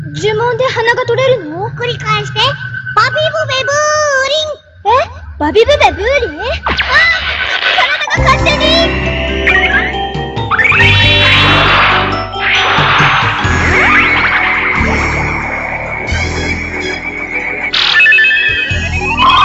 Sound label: RINGTONE